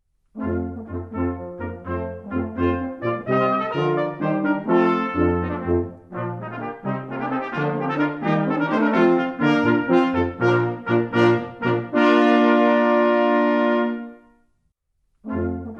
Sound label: tutti, royal danish brass